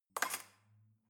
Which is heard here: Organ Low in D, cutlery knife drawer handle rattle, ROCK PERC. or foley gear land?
cutlery knife drawer handle rattle